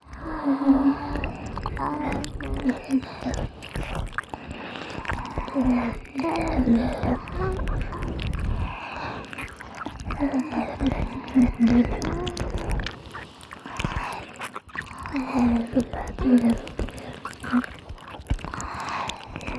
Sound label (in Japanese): ディープキス＋れろれろ両耳舐め, ASMR; おまけループ（オナサポ）; 3WAYループ